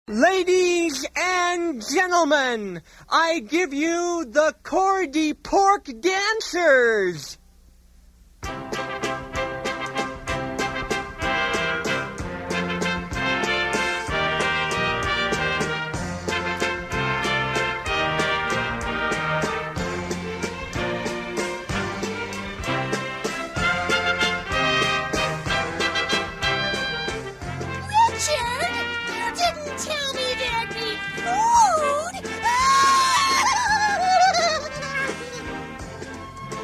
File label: Waltz